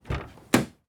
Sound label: truck door open; transportation